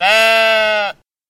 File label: sheep